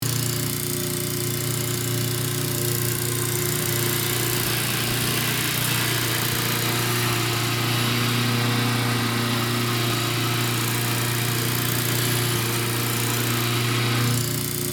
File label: Machine room ambience machinery movement loop